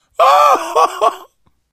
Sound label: laughter, survivor voice